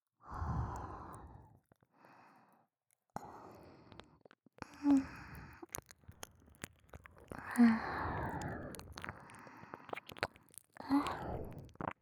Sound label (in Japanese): ねっとり耳舐め; 鈴姉; フェラじゃないもの